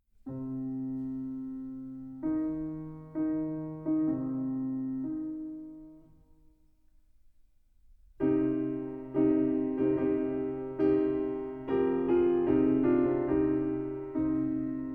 Marche funebre

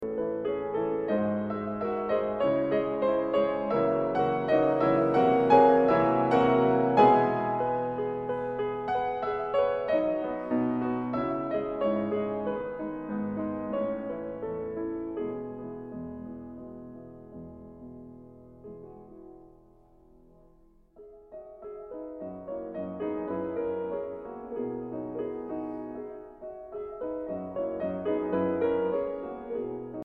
Etudes Op. 10 No.3 E minor

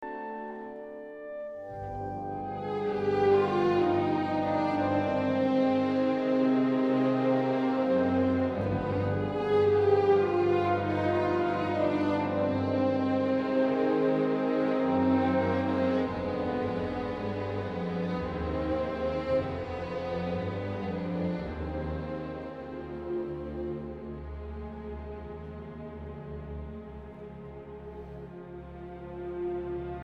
Andante Non Troppo

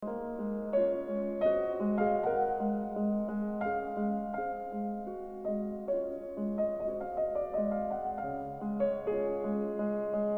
Prelude in D Major op.28 no. 15

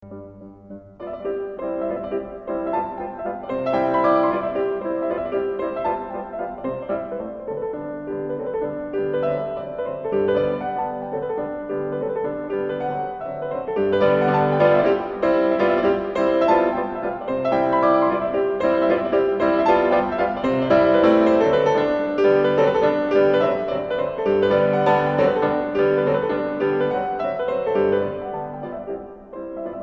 Mazurka in C Major

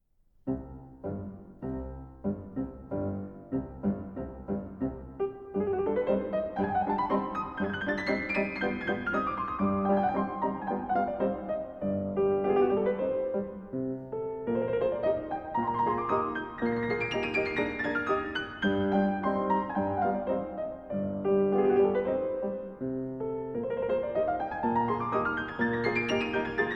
Rondo Op. 1 C minor

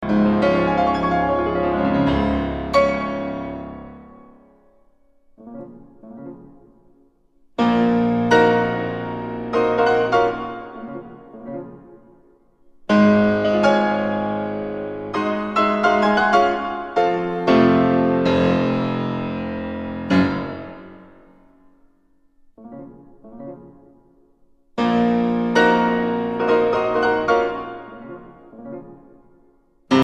Scherzo In B Minor Op. 31

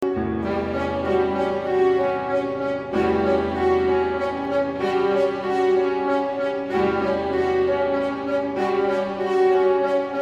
Polka Allegro; Little Requiem For A Polka Op. 66

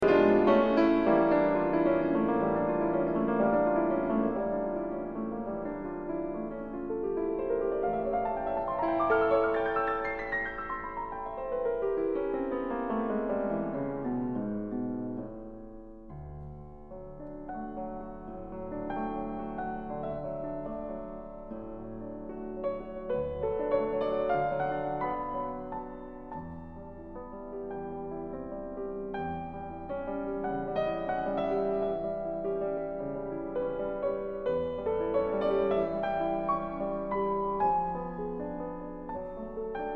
Sonata op. 58 B minor Allegro maestoso

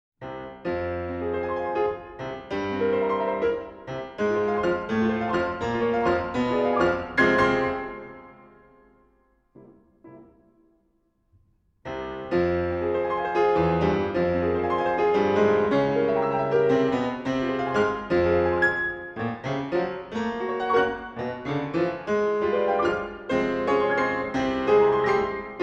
sacred piano

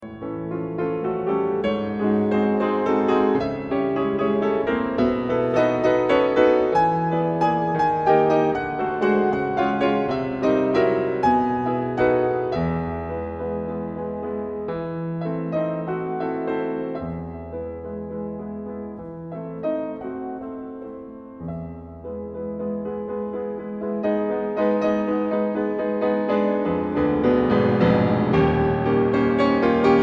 Preludes Op.28 No.17 A Flat Major

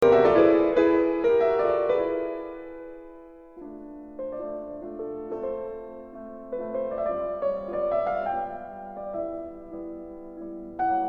Mazurka in A Minor op. 17 no. 4

